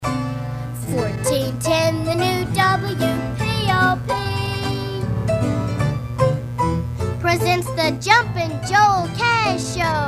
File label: jingle; Joel M. Lubin